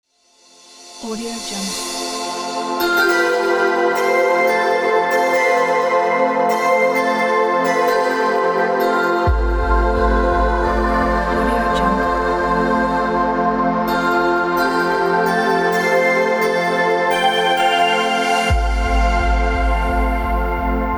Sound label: the portal logo